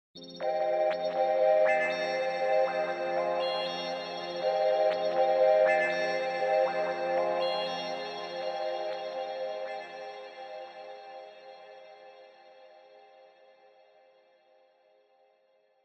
astral textures spirits sprites bell like rhythmic uncertainty f min; Sonniss GDC